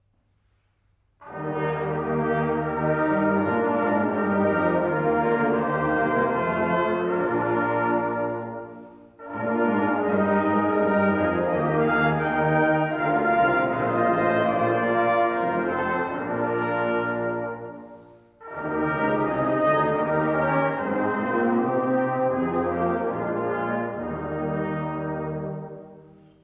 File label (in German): Gloria sei dir gesungen, Posaunenchor; blaesergottesdienst 2022